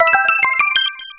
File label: 1up